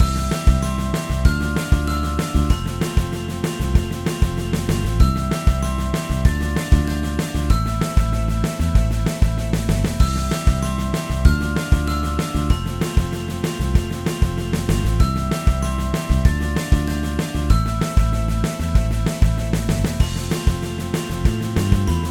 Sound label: Start Music